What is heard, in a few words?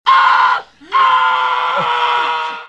scream; kinison